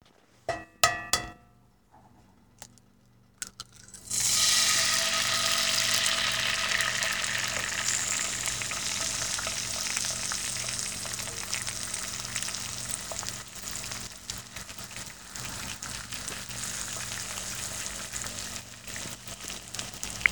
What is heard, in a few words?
Egg Frying In Pan